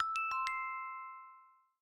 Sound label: Bell, notifications; Honor Power